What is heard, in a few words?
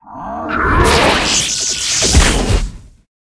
dark knight attack, monster